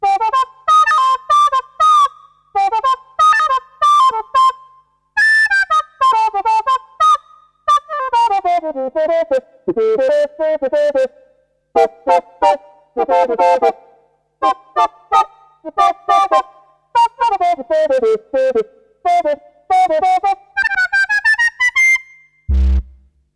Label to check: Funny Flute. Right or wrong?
Right